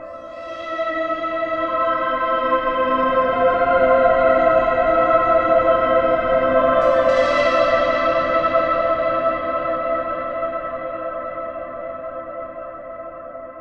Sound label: Bpm141 Synth Loop Dm, Dubstep; Airy; Logos; Prime Loops; Dubstep Illusions